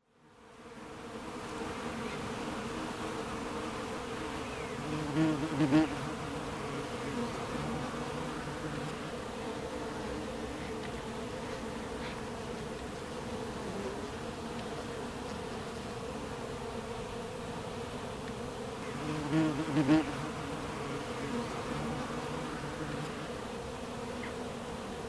busy wild bees buzzing outside nest in farmhouse wall